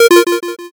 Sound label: error